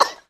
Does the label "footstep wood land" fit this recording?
No